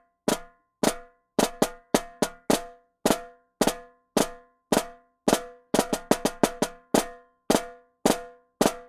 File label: Jungle Snare, African; Sonic Foundry (Sony Creative Software); Continental Drift Aftershocks